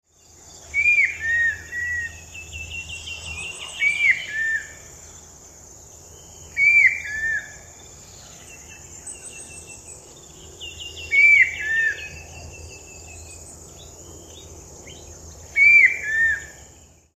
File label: brown winged kingfisher